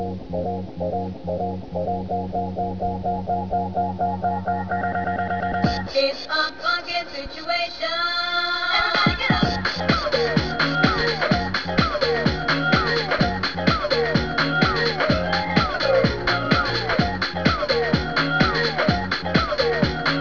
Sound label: vox; defected